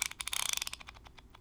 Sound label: Ratchet Muted; Smol Mechanisms